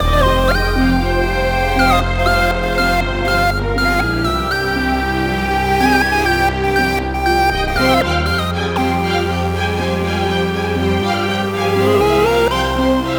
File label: boss section forward loop 120bpm; chicory a colorful tale